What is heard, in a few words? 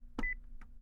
office phone dialing beep